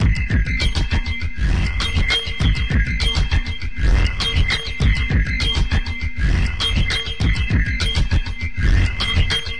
buzzcut thick pinging, drum loops and breaks, 100 bpm; 091 to 100 bpm